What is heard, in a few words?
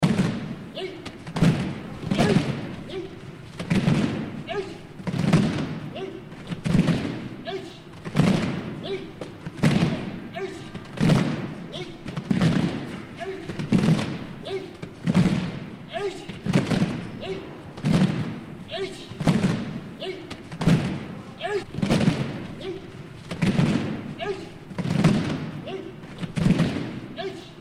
atmo asia judo training